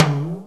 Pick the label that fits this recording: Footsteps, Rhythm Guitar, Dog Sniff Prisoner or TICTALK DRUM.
TICTALK DRUM